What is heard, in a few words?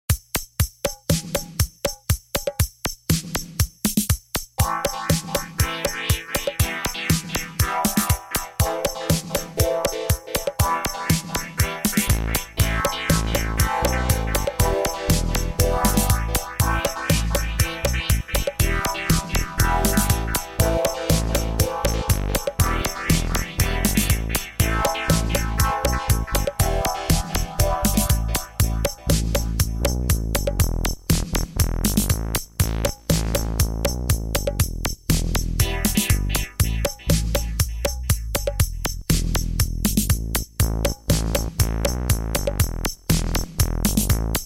MPC Live Microkorg